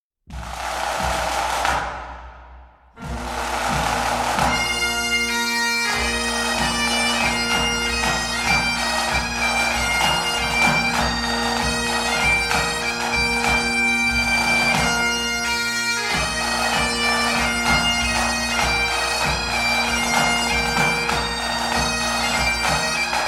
Pipes and Drums, 4 4 March; Royal Irish Regiment

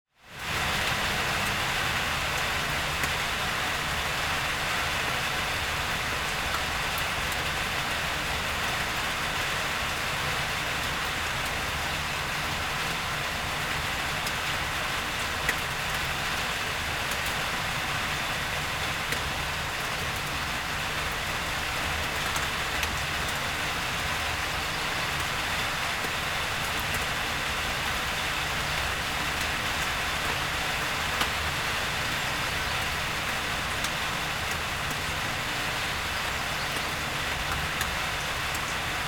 Heavy Rain on Roof

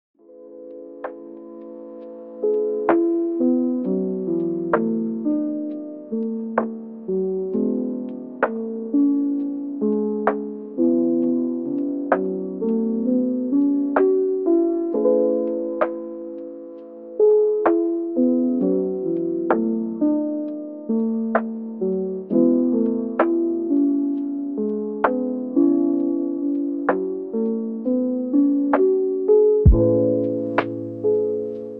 lofi, Chill Vibes

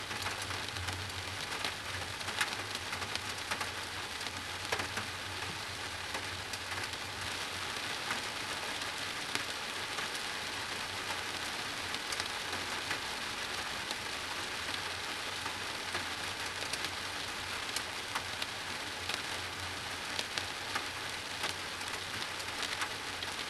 Thunderstorm, Rain

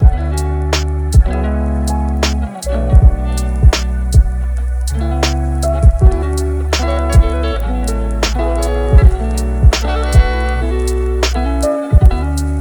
Relaxing Study Beats

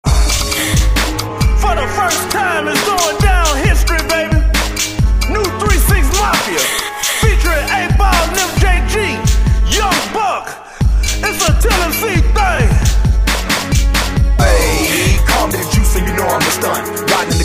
beat, acapella

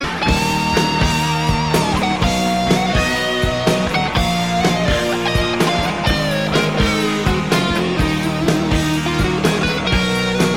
s Solo

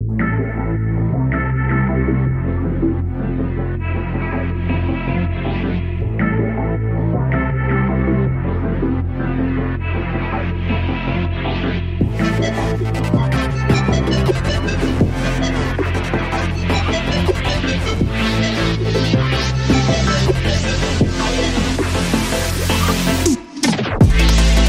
Instrumental

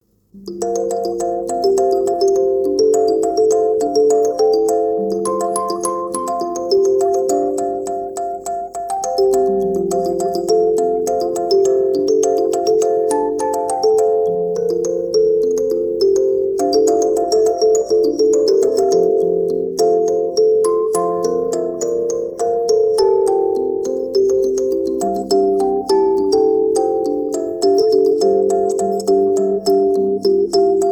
MUSIC BOX

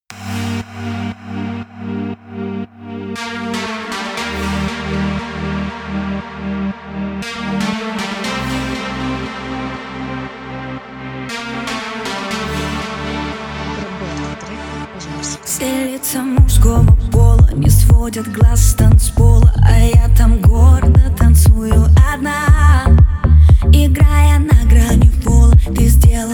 BASS AUTO, Bass

